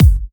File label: Bassdrum; Best Service; House Definition VOL-1